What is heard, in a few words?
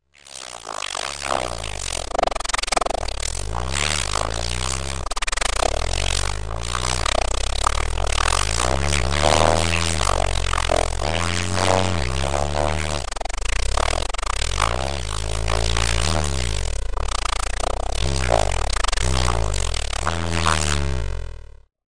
Machine Snorer